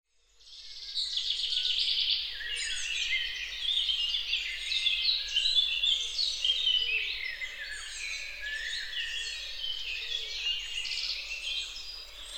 Vogelkonzert Laubwald Fruehling; der wald

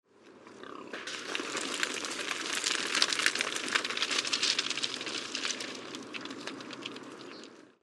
Wildschwein, wald; erlebnis wald

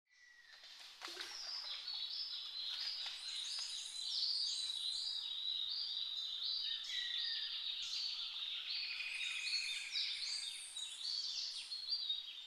Morgen in den Anavilhanas, Amazonas Regenwald; brasilien; abenteuer

